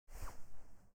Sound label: Remington 870 Draw; zps; Weapons; Firearms